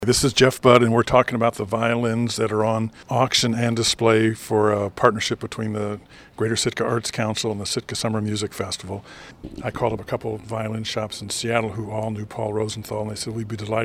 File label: violins